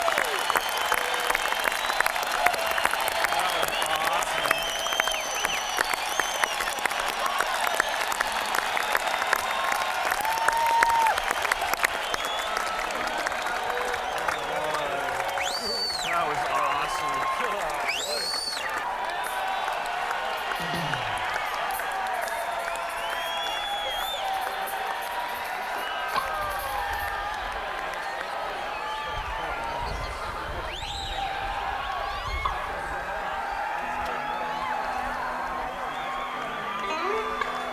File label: Encore Break